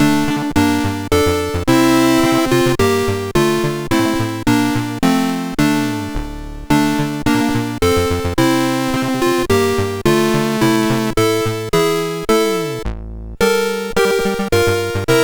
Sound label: Xmas tune, sid MOS6581R3